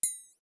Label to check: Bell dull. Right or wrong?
Right